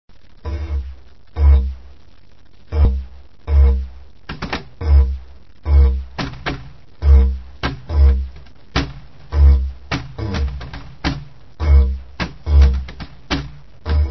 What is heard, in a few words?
drum & didge